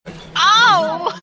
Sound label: oww